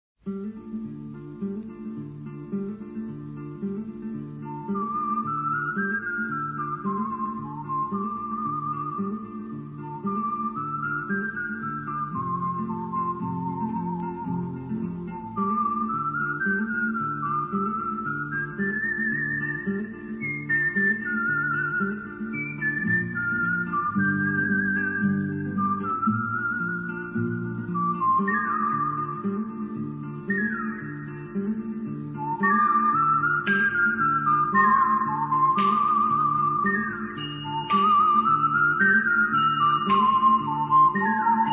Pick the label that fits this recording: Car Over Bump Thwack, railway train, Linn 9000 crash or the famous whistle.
the famous whistle